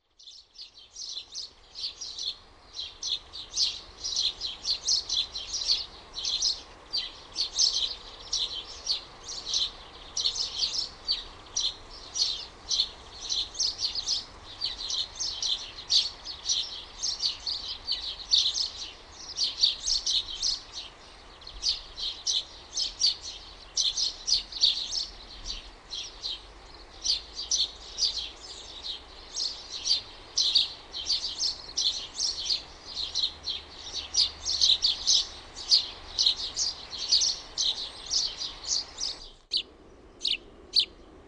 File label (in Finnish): VARPUNEN; LINTUAANITYKSET